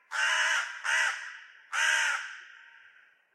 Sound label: crow; minecraft; Remastered; ambient